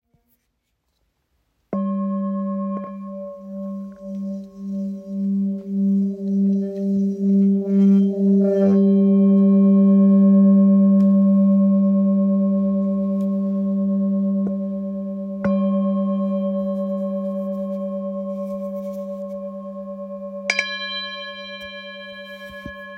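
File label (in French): FM Sol, bol chantant; Bol Chantant Full Moon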